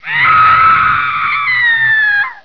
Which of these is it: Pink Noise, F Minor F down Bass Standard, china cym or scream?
scream